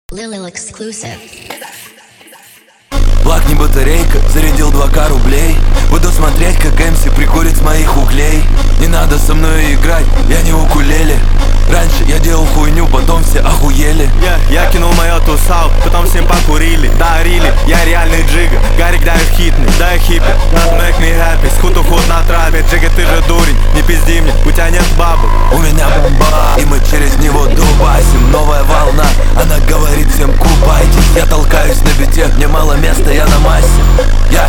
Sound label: ukulele; Укулеле